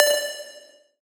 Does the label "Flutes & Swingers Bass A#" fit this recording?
No